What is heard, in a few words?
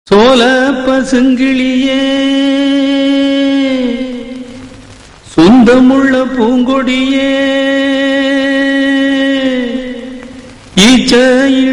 Tamil Sad Song Ringtone